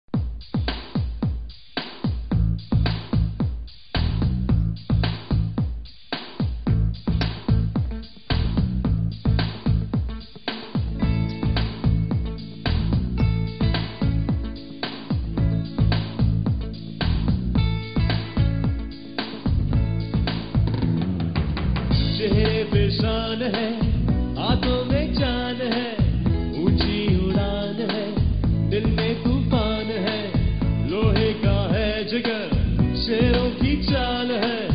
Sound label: Cricket songs Munda pakistani; Star asia tv